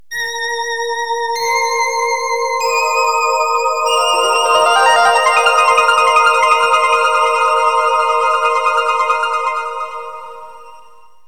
space mix, music; KABOOM! - Wild and Wacky Sound Software

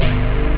terror, drums bass; OctaMED SoundStudio V1

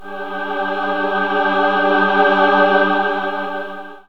choral, music, chorus; KABOOM! - Wild and Wacky Sound Software

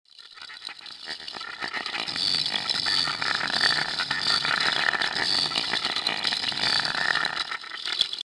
bug hole, bugs; Starship Troopers

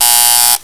zing; MOBICLIC; sciences

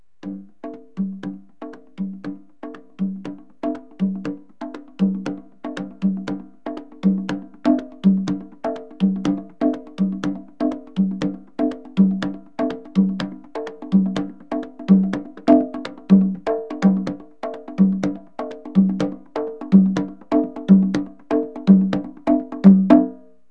congas

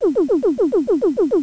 HOVERING

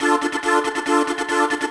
syn voc, keyboard, 140bpm; hous-tec

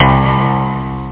bass cool; Amiga 8-bit Sampled Voice; 8,363 sample rate

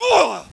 Death; Demo Man

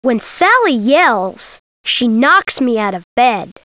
yell

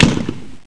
bang; YAGG

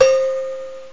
Agogo; GMPlay

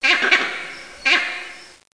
jungle; Renaissance

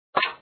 Step

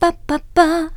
UOW, Vocal Samples

